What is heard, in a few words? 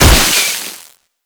Weakpoint Destroy; Warframe; Nineteeen Ninety Nine; Infested Survival